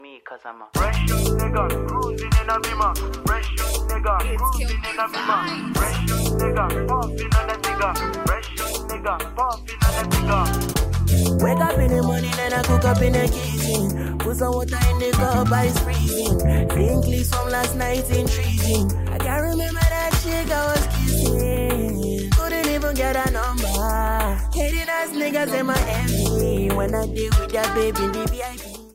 English Song Ringtone

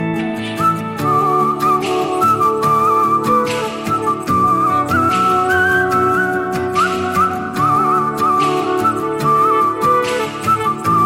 Punjabi Love Song

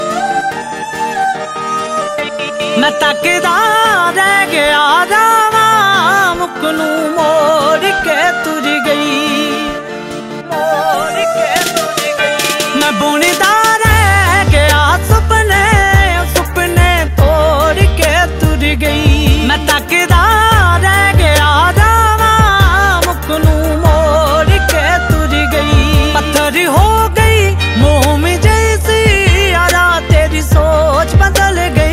Sad Punjabi Song

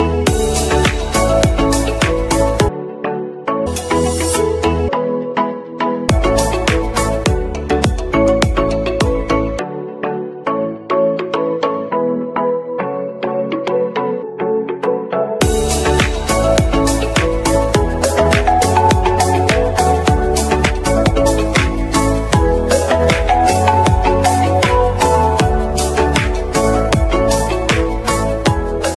oppo ringtone

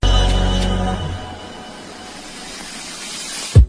Hindi Whatsapp Message

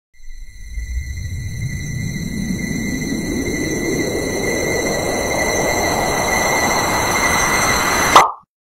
PoP Message Ringtone

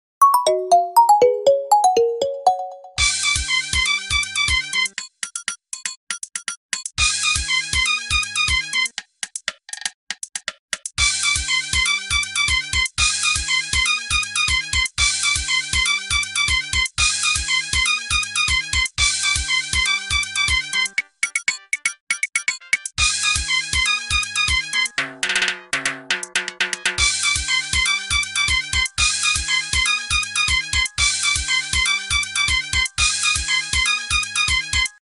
Ringtones Remix Samba Rumble; Nokia

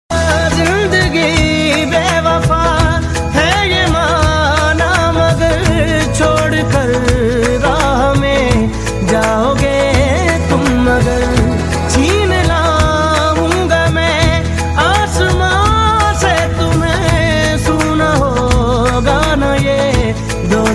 Ringtone Hindi Sad Gana

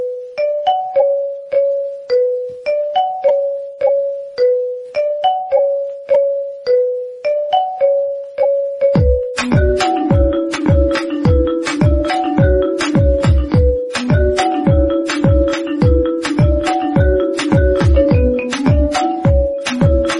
Samsung Ringtone Remix

